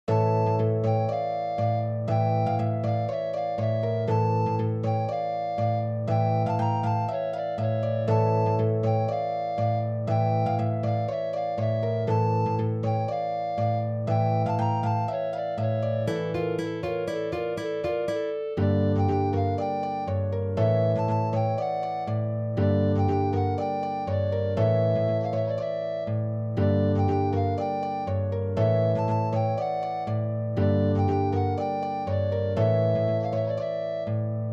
tzigane trio harpe

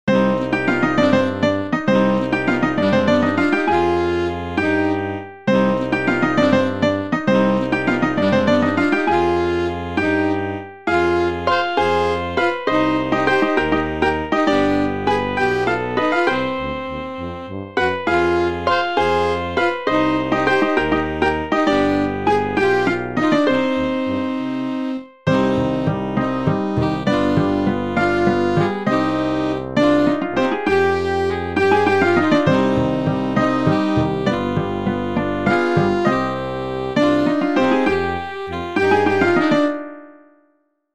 hop la fanfare

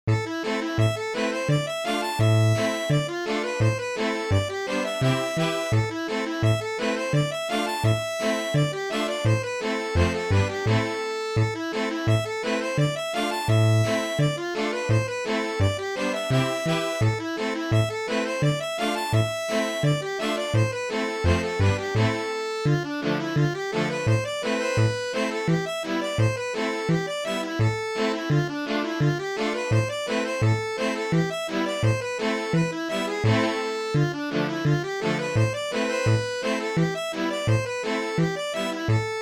accordeon

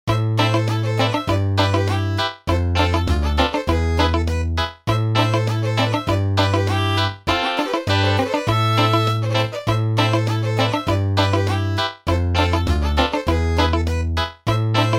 batterie simple; vagalam

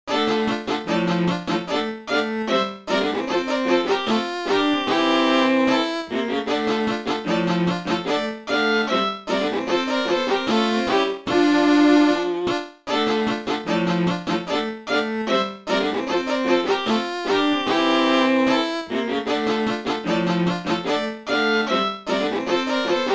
scottish 4 violons; lanna villes

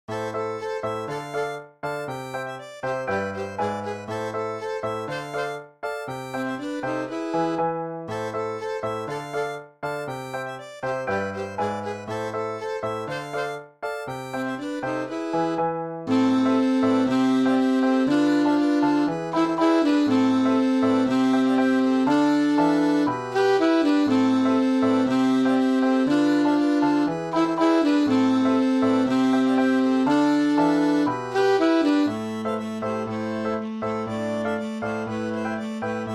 bourree; du zinzin

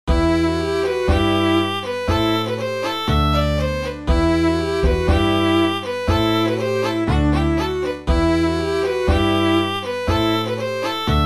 bourree; bec de lorient

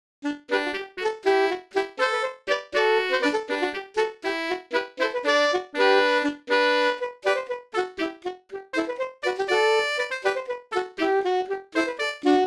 bourree droite du morvan

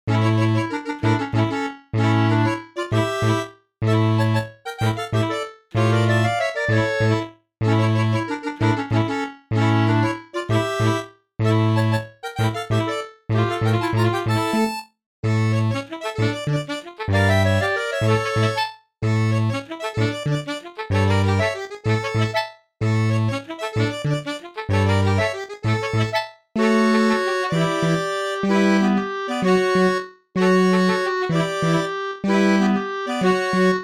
fanfare; cercle; louhans

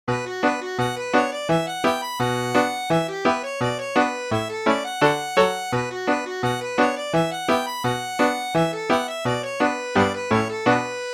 accordeon

